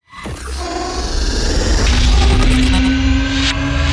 composite hit complex long

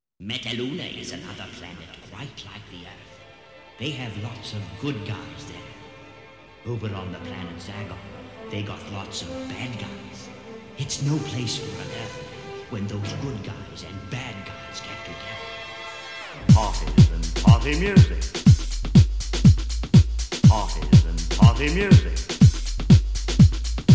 808 bass version

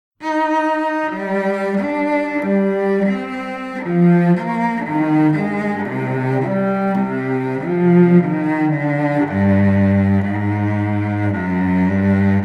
cello and orchestra

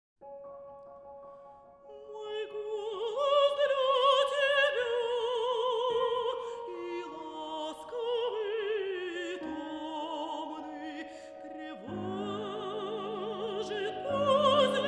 songs for voice and piano; nikolai rimskii korsakov; op 7

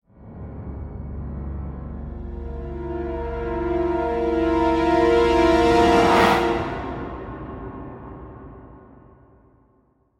sting brass flare C

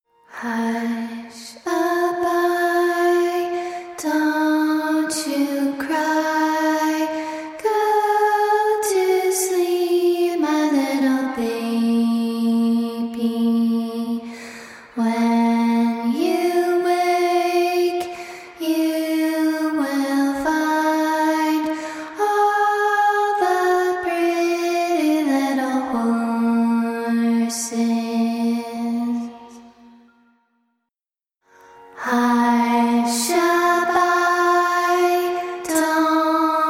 lead vocals only

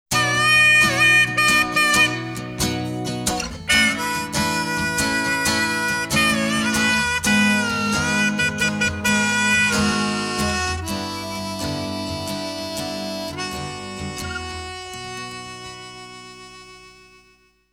b major blast

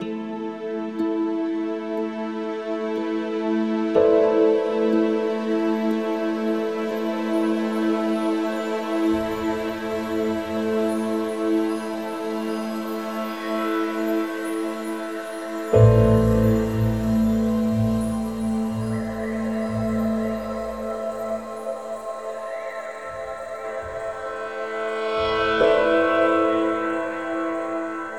no guitar mix loop

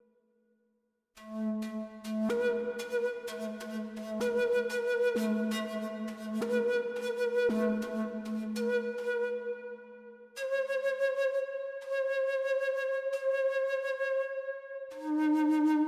native american flute

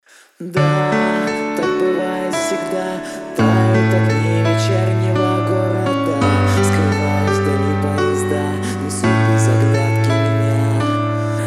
cover acoustic

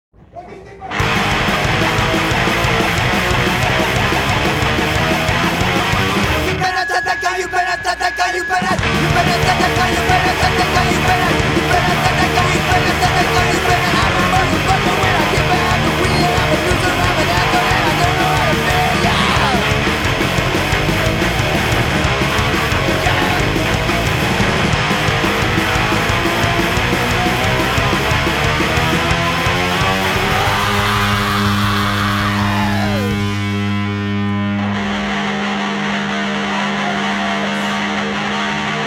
blues

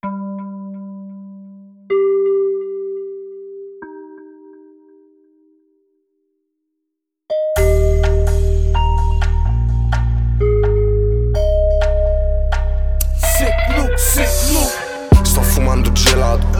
lullaby instrumental

